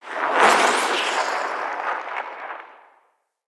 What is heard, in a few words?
Siege Proj Whoosh; Midrath; Warsong Prologue